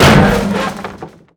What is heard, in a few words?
Medium Impact; Totaled; XBOX